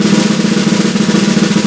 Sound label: snare, Roland; LA Composer